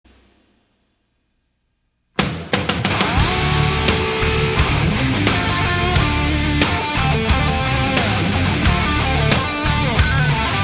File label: Solo